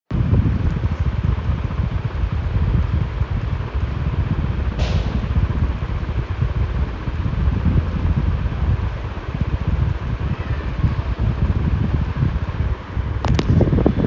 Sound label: fan sound; tranquil fan sound; Whispering Zephyr